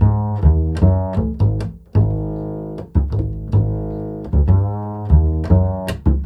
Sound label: CITY G#, Bass, INNERCITY; Spectrasonics; Bass Legends